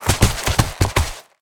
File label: Camel Mount Attack